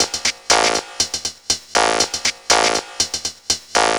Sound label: Combo Hat